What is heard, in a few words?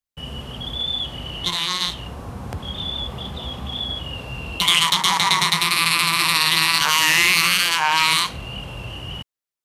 alligator rumblings, reptiles